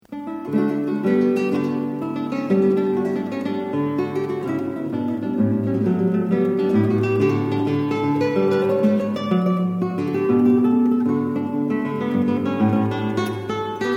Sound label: Jig, Guitar; solo guitar